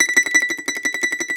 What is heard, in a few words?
MAND TRM, Mandolin; E-MU Producer Series Vol. 7 – Old World Instruments